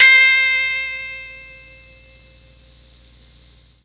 C4 GUITAR, Mellotron MKII GUITAR; Mike Pinder - Mellotron Archive CD

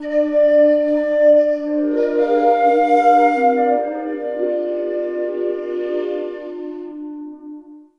Valkeri Flute; Sonic Foundry (Sony Creative Software); Ma Ja Le Ethereal Textures; Flutes

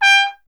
trumpet, G 1; Miroslav Vitous; Solo Instruments VOL-1

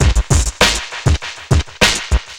drloop, drumloops, Vinyl Attack; Masterbits

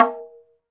PRC BONGO L

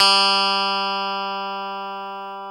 G2 Strat, clean; InVision Interactive; Lightware VOL-1; Instruments & Percussions

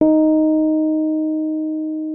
RHODES MK1M D#4; Xsample VOL-1

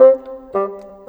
Bassoon, Cinematic; Sonic Foundry (Sony Creative Software); Orchestral Series VOL-3

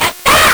LSDj, Nintendo Gameboy; 300 Drum Machines

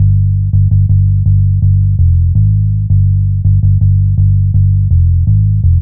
bass loop 165bpm G1, Drum&Bass; Total Drum&Bass

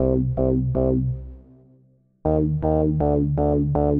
SH101 Bass Loop (120BPM), retro house

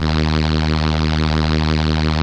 ARP STR ENSL, First Call Vintage Keyboards

